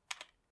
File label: keyboard key press; keyboard typing